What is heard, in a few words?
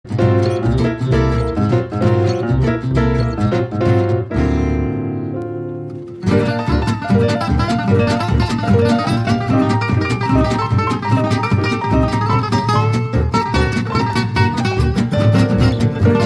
swing, jazz manouche